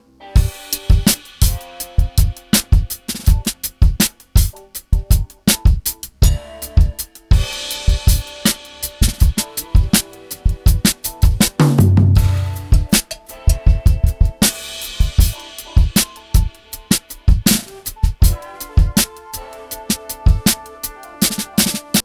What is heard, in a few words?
48k 12k Drums EQ